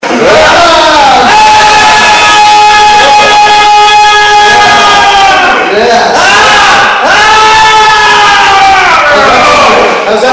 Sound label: thunder - thunder